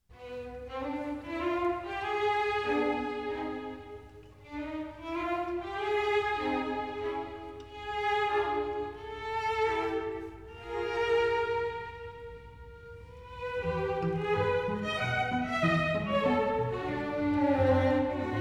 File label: ballet for string orchestra; Apollon musagète; ballet in 2 scenes; Variation de Terpsichore; Stravinsky